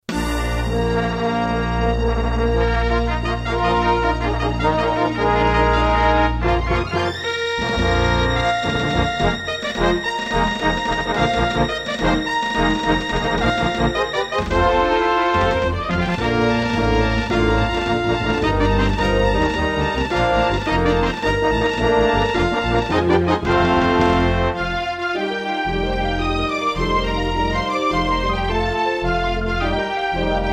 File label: Intro, Roland SC-8820; Dark Forces